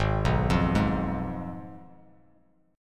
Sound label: G sharp Minor 6th G sharp down